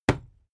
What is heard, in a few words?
fight impact sweetener cloth; nahkampf; soundpool